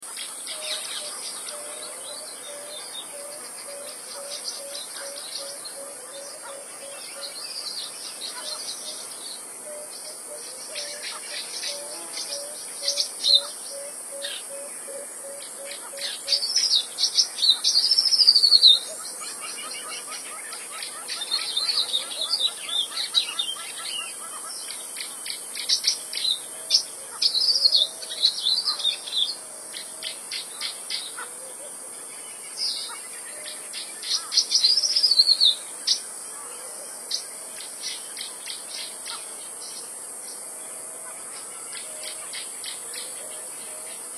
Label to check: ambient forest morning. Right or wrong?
Right